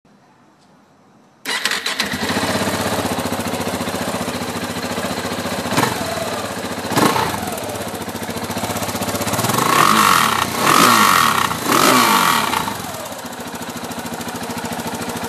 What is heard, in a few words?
KTM 690 SMC